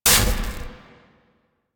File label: Scrap Container Shutdown; Warframe; Missions; Zariman; Zariman Assassinate